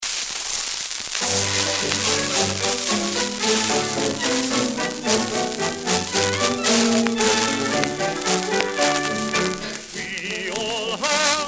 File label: Mono